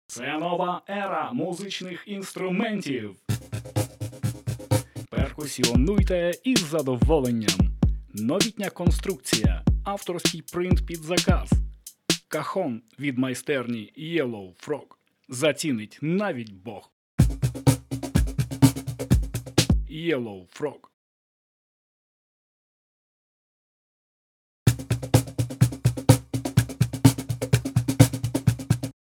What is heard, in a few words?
Cajon